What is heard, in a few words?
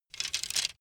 bamboo sticks; objekte; holz